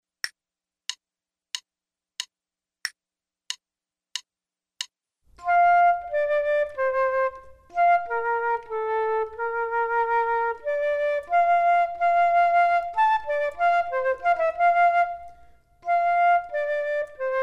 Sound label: Duets flute; Selected Duets for Flute Podcast